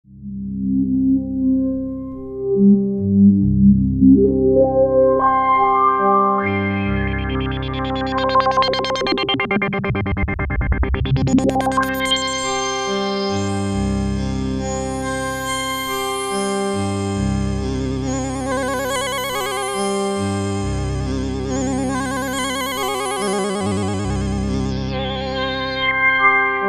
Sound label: DW8000 joystick